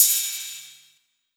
Ride, Cymbals

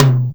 Toms; Percussion; Mutekki Media; Vengeance Electroshock Vol.2

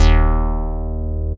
Bass Shot D#

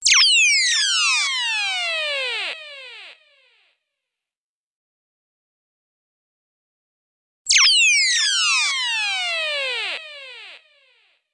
129 Trance Bm Sfx, Electro Synths; Prime Loops; Complex Electro Synths; TranceOut

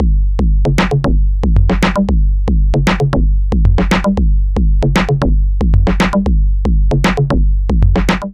115 bpm, Digital Breaks; Loopmasters; Jon Carter & Alex Blanco; Block Party Essentials

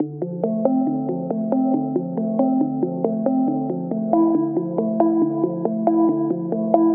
Bpm138 Heaven Mallet Loop D; Dubstep; FX Loops

